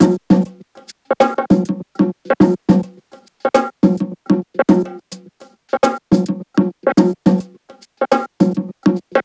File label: percgroovefx 105, Perc Grooves; Slate; SD SEISMIC Drum Sample Pack; Signature Perc Grooves